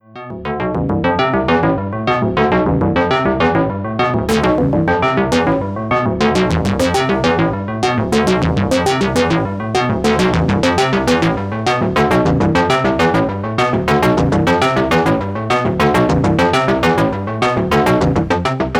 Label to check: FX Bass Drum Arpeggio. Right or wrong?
Right